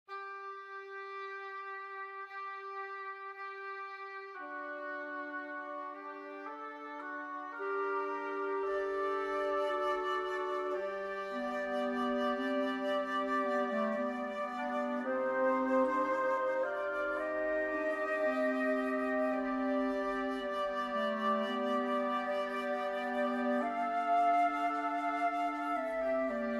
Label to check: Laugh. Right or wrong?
Wrong